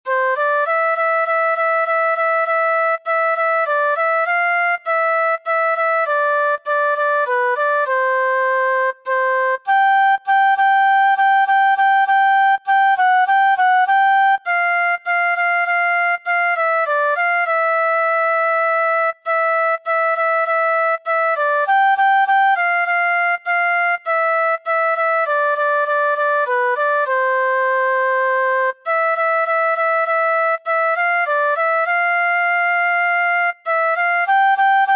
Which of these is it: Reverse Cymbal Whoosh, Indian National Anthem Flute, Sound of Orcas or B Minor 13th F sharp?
Indian National Anthem Flute